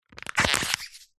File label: household cup plastic empty squeeze crush; zapsplat